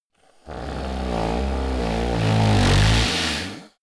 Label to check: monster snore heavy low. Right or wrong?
Right